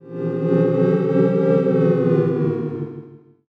chatter

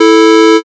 buzzer end

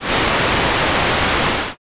fire

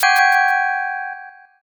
sting

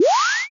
dash

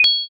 notify like

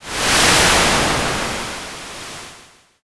wind

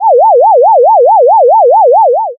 ufo

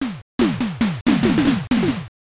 rockslide

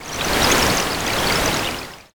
bath soak

